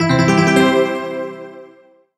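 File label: Solo Electric Piano Positive; MUSIC EFFECT; Solo EPiano Positive; startide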